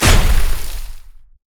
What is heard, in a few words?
ravage strike; nautilus